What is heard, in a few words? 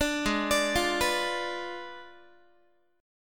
Ab Diminished 7th Ab, Guitar, 4A, 4E, 5A, 5D, 5G sharp, 6C sharp; Abdim7 arpeggiated; JGuitar